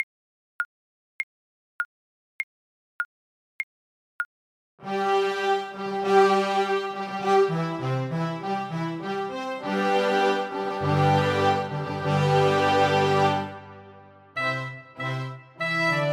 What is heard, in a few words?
rossini william tell, flute